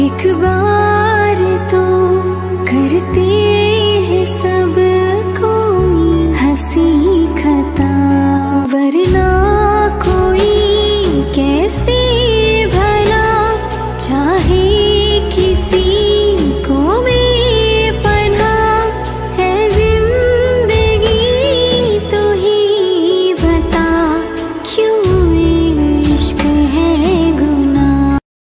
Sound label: Female Ringtone